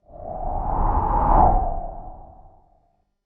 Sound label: Ethereal Wind Sweep; slow motion transition